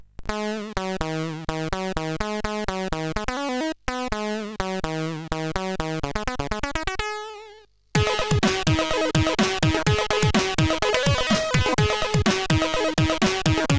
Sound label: Ding Dong, sid CSG8580R5